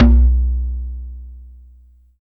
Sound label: LEGGO LOG, PERC; TEK PERC1; Drums In Your FACE!